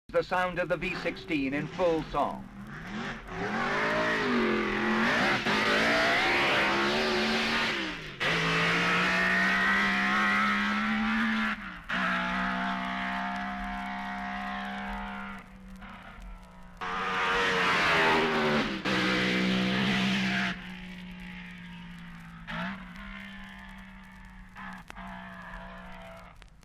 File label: V16; BRM